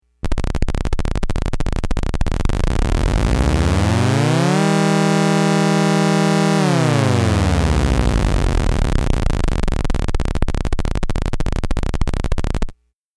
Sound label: sweep